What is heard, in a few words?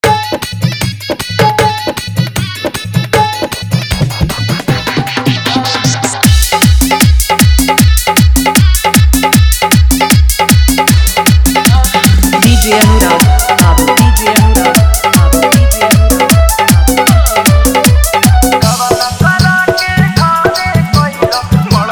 Bhojpuri Dj Song Full Dhollki Bass; Rod Show Garda Dane Mix